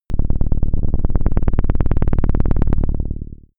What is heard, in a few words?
shot rattling darkness; pulsieren